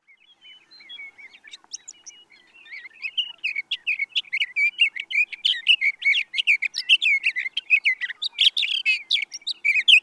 Sage Thrasher

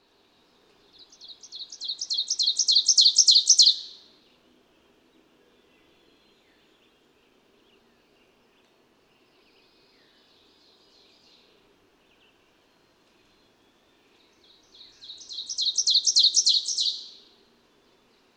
Ovenbird

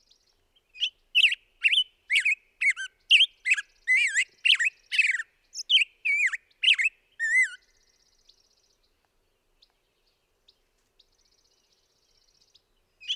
Black-headed Grosbeak

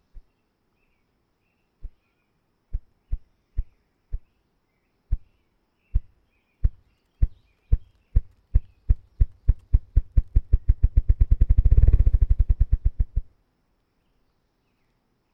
Ruffed Grouse

